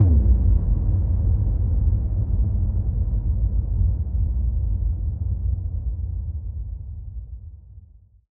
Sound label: IMPACT